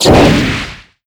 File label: fire pipe burst